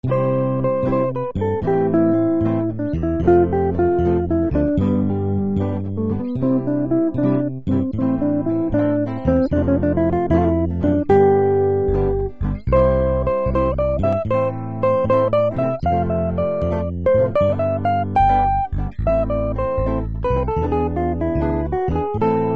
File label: C-Am